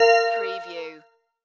Multimedia Alert

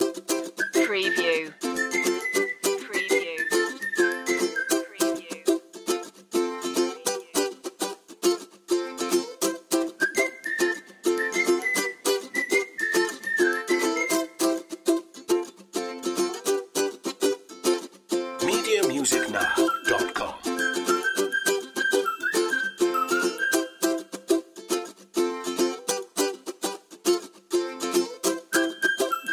ukulele whistles and bells

